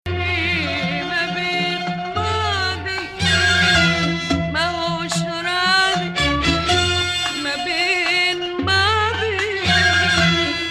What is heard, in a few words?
Hijazkar